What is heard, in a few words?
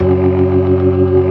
ORGAN ALL STOPS; Keith Emerson Signature Series